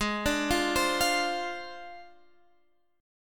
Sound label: A flat 6th Flat 5th A flat Guitar Half Step Down, chord